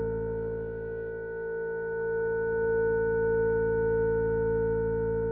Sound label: Wheel Aigu Sound